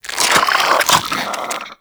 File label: Infested Rifle Reload; Warframe; Quanta